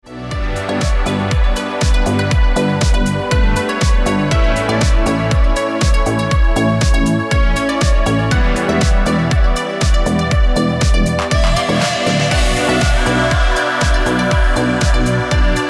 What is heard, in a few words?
Ringtone